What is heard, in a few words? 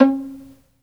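SOLO VIOLIN, Synclavier Strings; ILIO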